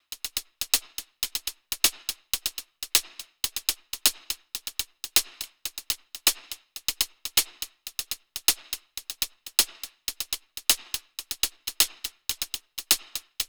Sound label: Hat loop 122BPM